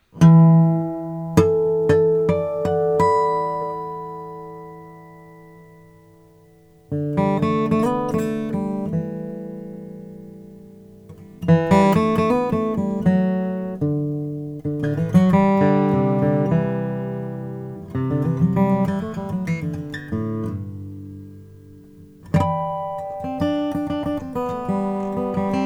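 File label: gibson j 45 rosewood vintage sunburst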